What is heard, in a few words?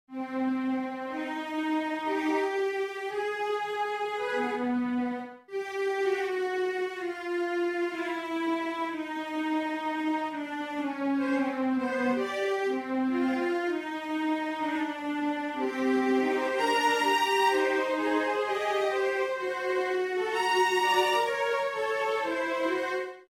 synth